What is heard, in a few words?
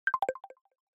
notification